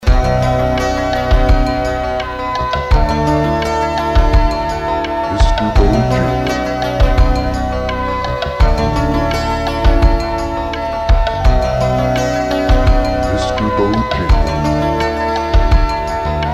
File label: on hold